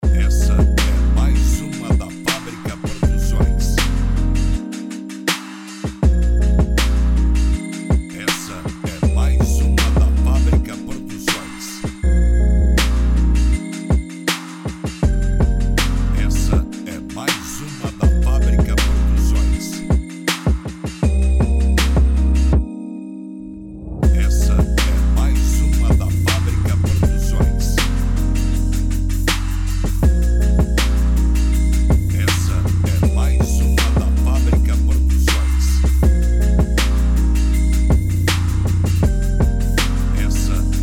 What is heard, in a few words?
beat trap